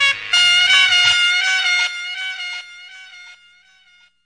horn echo; HORN LOOPS

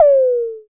electro perc; STYLE KITS (Hip Hop, Etc); Precise Electro Percussion

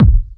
bonger kick; Kicks; single hits

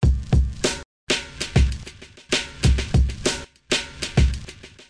098 cubists broken beat, drum loops and breaks; 091 to 100 bpm

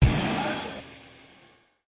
Industrial Kick, drum kit; REAL LIVE KITS; Osama Qureshi DrumKit

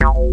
nice bass; Basses

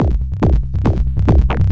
140 dirty n distorted

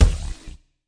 fx drum

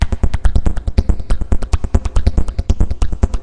140 hexbeat, DRUM LOOPS and BREAKS

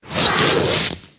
vintage synth rip, Cartoon Sound Effects; Cartoon Sound Effects Kit

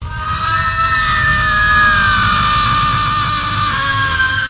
machine scream; Various Strange Sounds and Effects

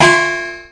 gongi; Clangy Crunchy Foundsound Kit

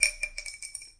bamboo fell on floor hit; Weird and Interesting Hits; single hits

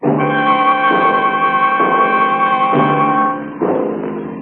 oldtime suspense; ORCHESTRAL and CLASSICAL

